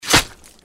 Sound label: gecko claw atk; npc